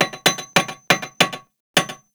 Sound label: Village Hammering One Off; Warframe; Eidolon Plains; Ostron Village